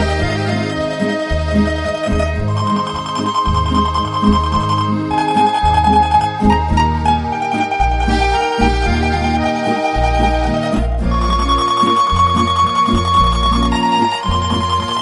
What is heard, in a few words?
TANGO Instrumental